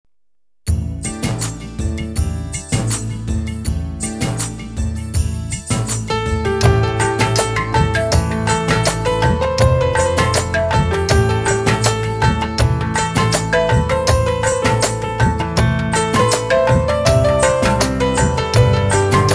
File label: Theme Music Instrumental; hum tumhare hain sanam 2002